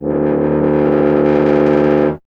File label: BRS TUBA, Solo Instruments